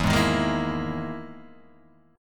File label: E Major 7th Flat 5th down Guitar Half Step Down